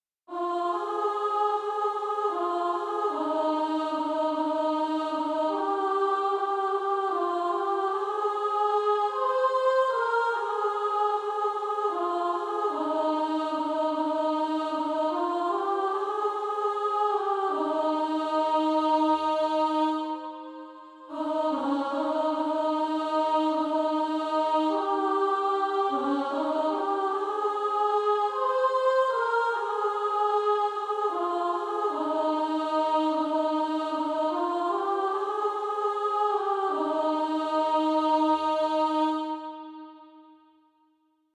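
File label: Female Choir, alto 2; O how I love Jesus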